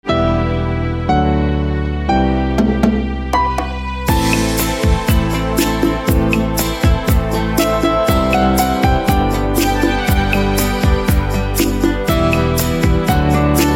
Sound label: Piano, instrumental ringtone